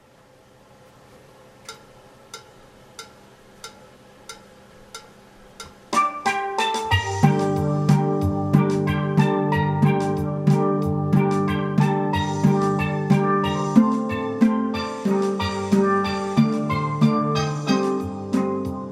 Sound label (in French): guitar theme + accompagnement; BELLA CAO